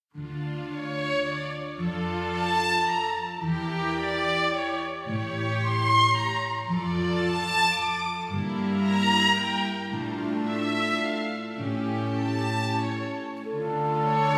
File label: clarinet ver.; Requiem, K. 626 No. 6 Lacrimosa; Mozart